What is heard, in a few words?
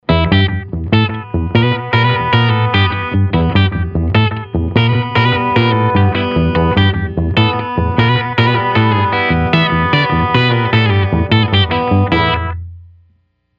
Delay; TubeDreamer72; Dyna-ssoR; Delay Llama